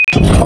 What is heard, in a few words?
turr spot